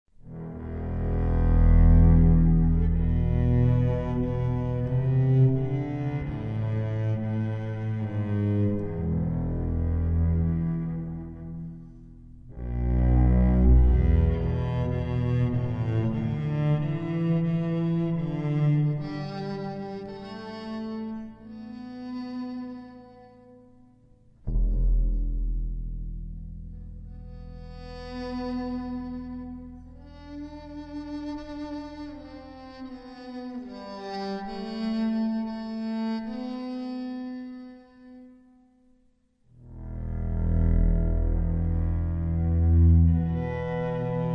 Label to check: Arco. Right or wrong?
Right